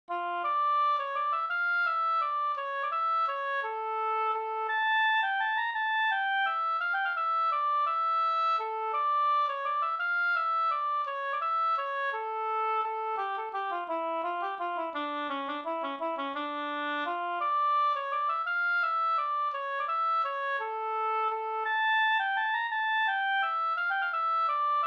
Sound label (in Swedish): vals; Bjornvalsen fr Ljusnarsberg